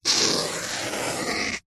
foley foam long scratch sharp

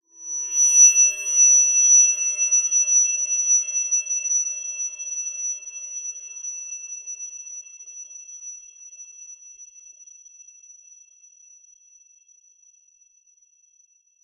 horror whine shrill high pitched; zapsplat

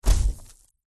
thud impact gritty debris; impacts; zapsplat

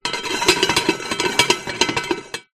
household pans pots 2x stacked turn

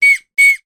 toy whistle blow x2 short; sound effects one

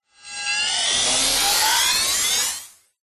science fiction robot recharge power up fast

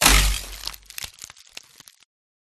Ice Spell Chilling Snap; sound spark

